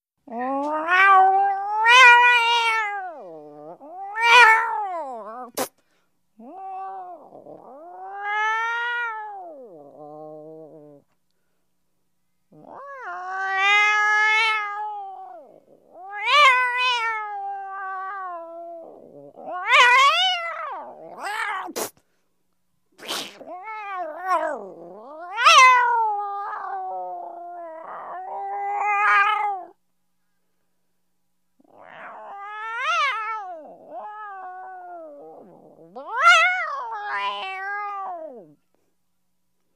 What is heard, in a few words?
Angry cat yowling and spitting